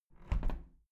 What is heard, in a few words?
window plastic squeak close soft